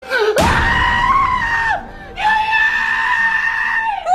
screaming; principal steinbeck

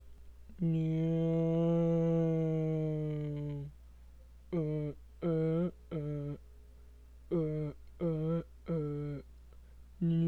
uh uh uh; ohio

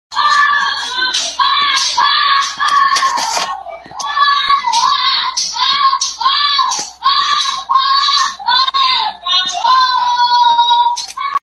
screams; roblox

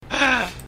breath

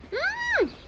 huuuuuumm

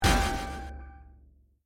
critical hit; omori; it hit right in the heart

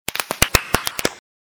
neck breaking

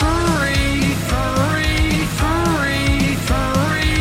furry song loop

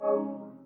ps5 popup

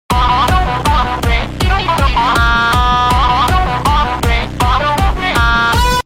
wimpy ahhh

